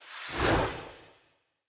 Staff Caster Cast Long; Weapons; Player; Staves; Starter

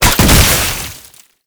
Tripwire Explosion; Enemies; Gameplay; Boss; Warframe; Oraxia

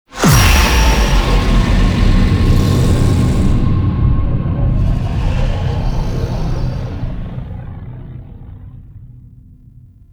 Light Elevator Eject; Gameplay; Warframe; The New War

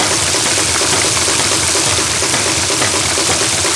Ocean Shower Machine Loop; Ambience; Props; Warframe; Grineer Ocean

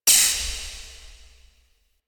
Corruption Pickup Despawn; Warframe; Missions; Zariman

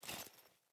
Grunt Foley Movement Armour; Sinecure; Melee Grunt

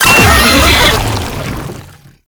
Death, Vocals; Enemies; Warframe; Proto Infested; Brachiolyst

